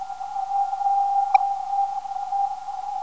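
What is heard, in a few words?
331.5kHz, non-directional beacon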